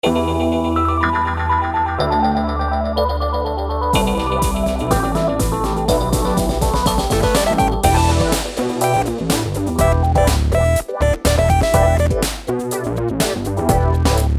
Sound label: funk in space